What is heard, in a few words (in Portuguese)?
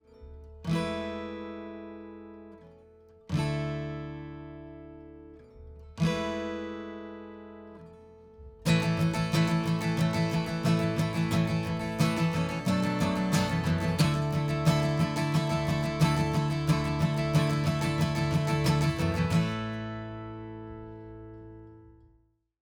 Violão antes; Click Turbo